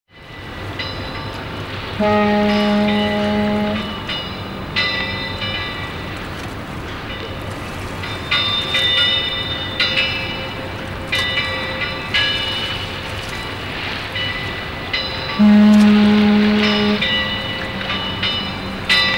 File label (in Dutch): Boot - Havengeluiden; KMP Film Video Sound Effects